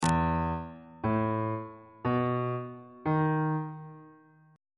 e major 7th suspended 4th chord intervals on bass clef